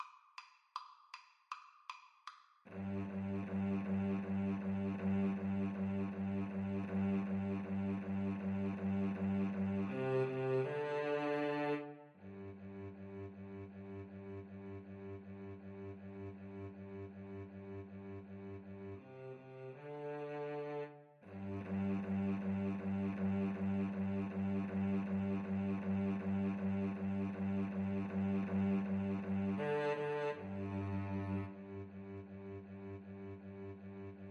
cello duet

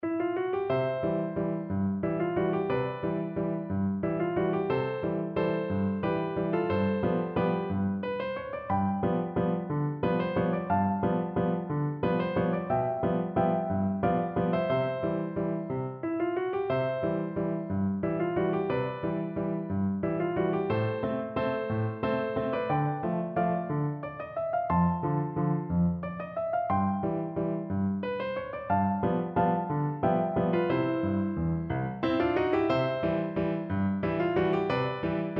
wedding dance, piano; lincke

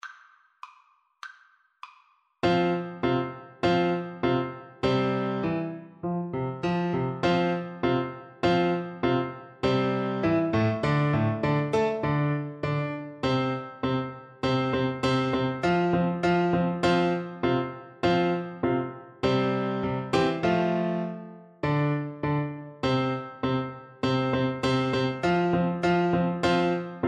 Turkish March, Bassoon

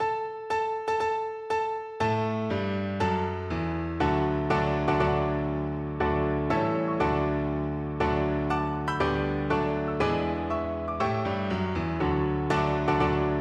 wedding march piano duet; wagner

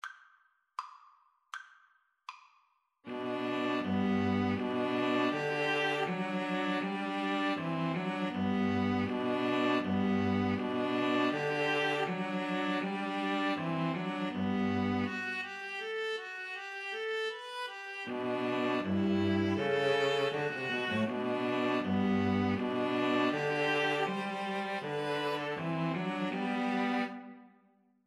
deck the halls, String trio

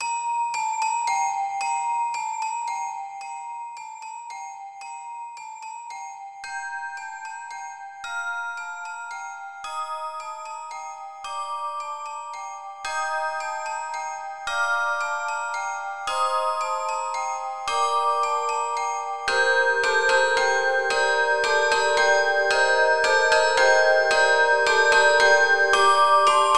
Carol Of The Bells, handbells

